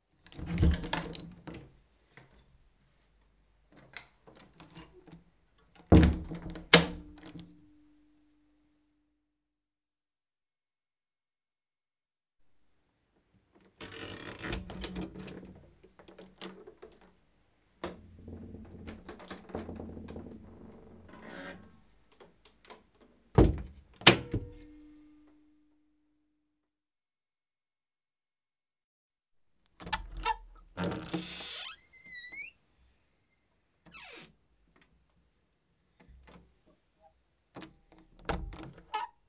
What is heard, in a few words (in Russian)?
Vhodnye dveri otkryvajut zakryvajut